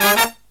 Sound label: HIGH HIT; USB Soundscan vol.29 - Killer Brass Riffs; AKAI; HIGHHITS